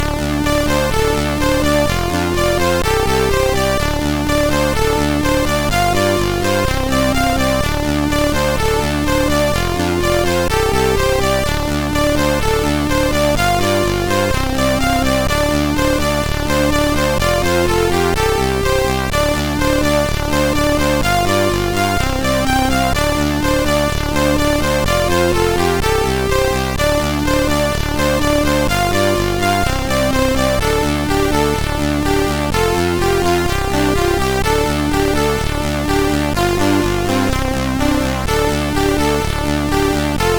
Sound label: sid MOS 6581R3; Tristar Intro